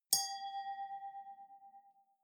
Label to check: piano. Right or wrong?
Wrong